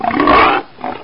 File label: bullchicken pain; mistake coop